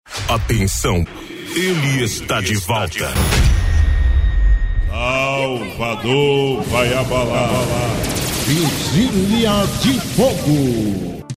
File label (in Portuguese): vinheta